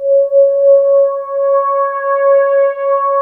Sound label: MOOG PAD C5; USB Soundscan vol.49 - Analog Fantasies; AKAI